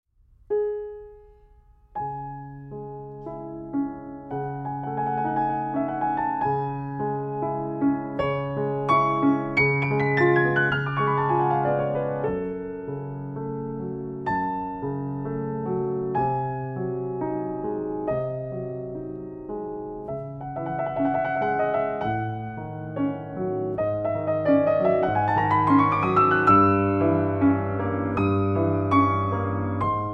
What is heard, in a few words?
Grand piano; PETROF